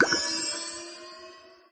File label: ui collect; puffleparty